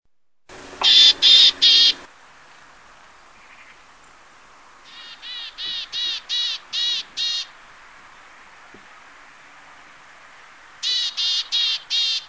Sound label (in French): Mesange boreale, chant